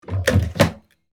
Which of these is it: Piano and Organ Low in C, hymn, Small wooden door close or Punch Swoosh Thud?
Small wooden door close